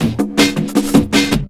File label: Drum & Bass, 160 BPM; LOOP; Ueberschall; Drum & Bass Flash Trackz